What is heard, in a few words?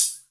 HH, Dance Mega Drums; Best Service; HH HIP